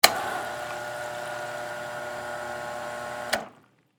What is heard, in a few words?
Soda Fountain, Industry, Soda, Fountain, Machine, Dispense; Soda Gun; BlastWave